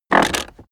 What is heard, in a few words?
car accessories armrest open hard; autos; verkehr transport